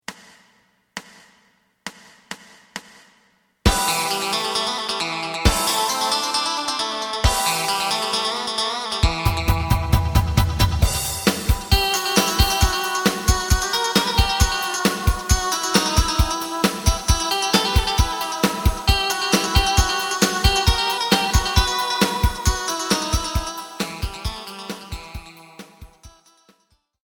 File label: bass; エレキバンド用カラオケ